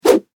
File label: Foley Whip Whoosh Heavy; Whooshes (Sound Effects:Sound Design)